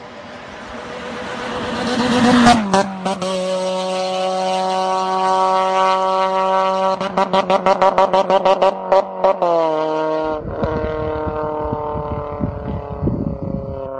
honda vtec flyby

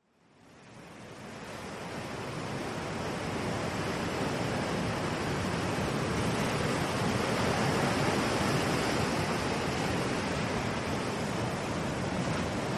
ocean waves long

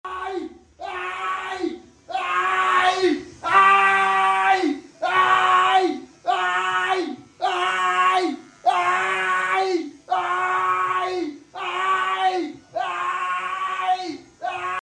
aaaai aaaai aaaaaaaaaaai

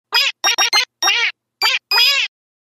song

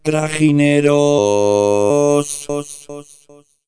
robot eco

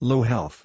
low health

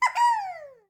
slime wooohoooo; slime rancher

